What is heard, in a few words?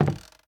Wood soft